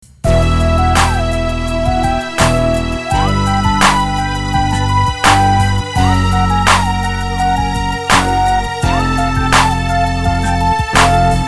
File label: chorus